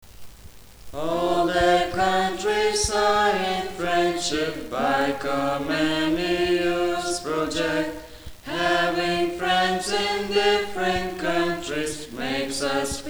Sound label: Lithuania anthem, song